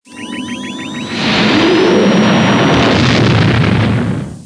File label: lightspeed; mil falcon; trilogy